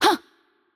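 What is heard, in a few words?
Attack Light, Korean; bloom minigame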